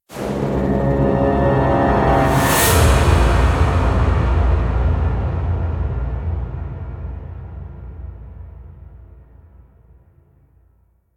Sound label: stinger